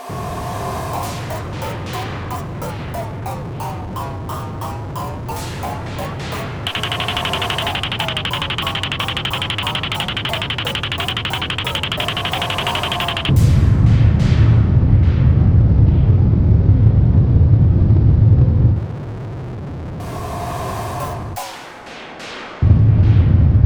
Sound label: White noise; Hangszinszolfezs